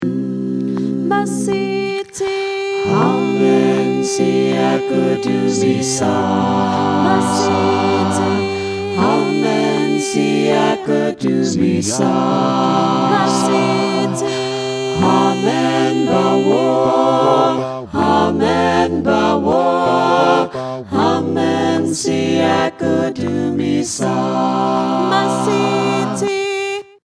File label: Amen Siakudumisa All, Gospel Choir